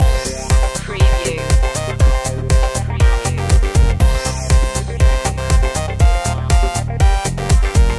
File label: dance floor loop